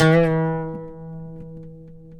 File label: PLK OUD; Roland - LA Composer vol. 3